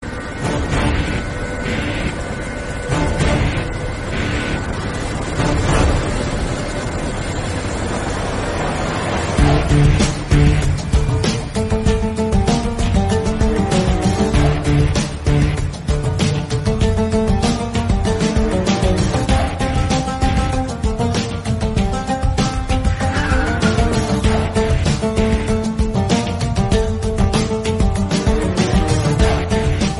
entry bgm tone; luck; sanjay dutt

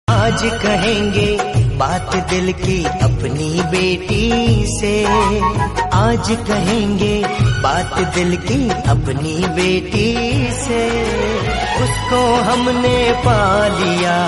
happy birthday Hindi

